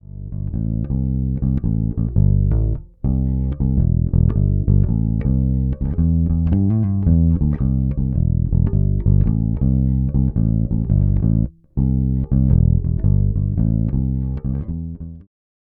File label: Standard Neck Pickup; Fodera Emporer